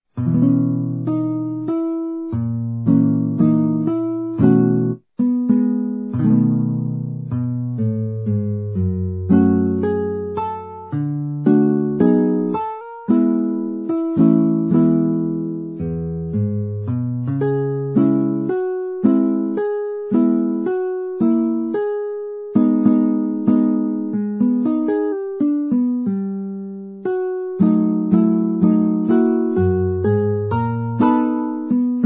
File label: Nylon Guitar